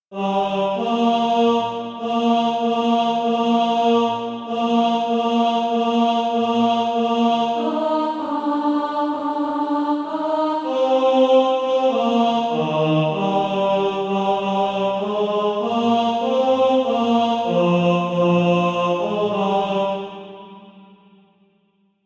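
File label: tenor; Fling Out the Banner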